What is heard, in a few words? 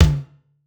tom hit